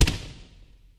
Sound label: fireworks; Skylanders Cloud Patrol; Arcade, iOS, Mobile